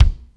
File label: kick